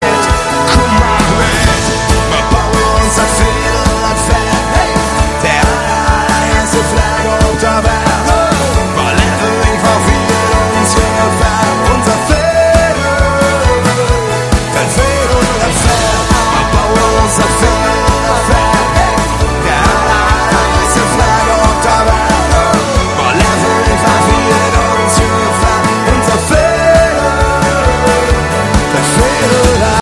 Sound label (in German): live mit orchester